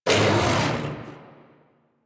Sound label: Kubrow Armored Vocal Attack Medium, Enemies; Warframe